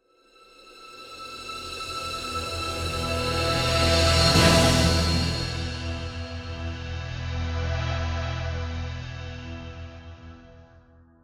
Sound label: orchestra swell accent